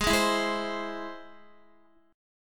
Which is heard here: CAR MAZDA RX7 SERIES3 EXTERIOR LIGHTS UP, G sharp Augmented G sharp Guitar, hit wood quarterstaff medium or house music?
G sharp Augmented G sharp Guitar